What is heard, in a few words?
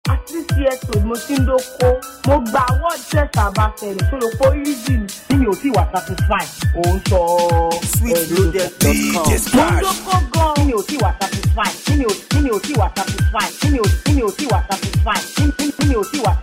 Satisfy Beat